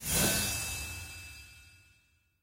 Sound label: win attention; goldentavern